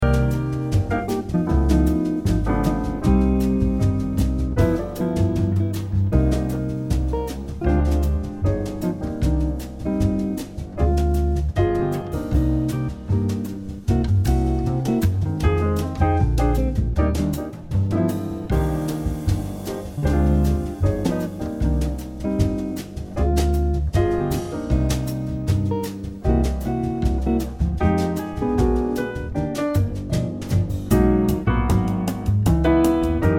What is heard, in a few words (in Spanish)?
Musica Jazz Instrumental; Desayunos; hotusa restaurante